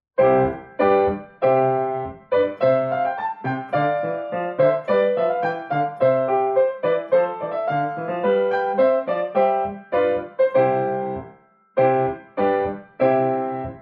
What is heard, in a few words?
Sing for Joy PIANO